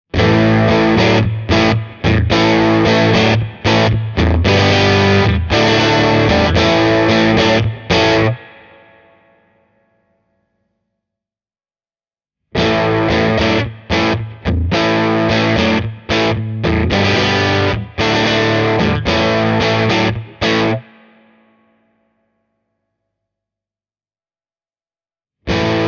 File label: brunetti singleman 16 tele hi fat vol 2 oclock power amp modes; Fender Tele (Volume at two o’clock)